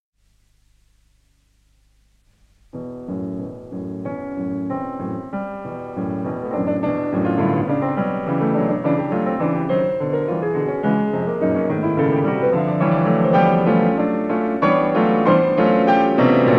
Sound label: Piano Sonata; Rough performance by the composer!